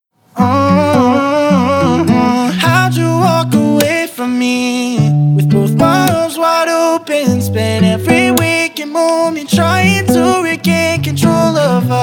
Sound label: POP